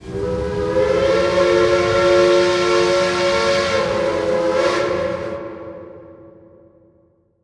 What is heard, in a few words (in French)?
coup de siréne train vapeur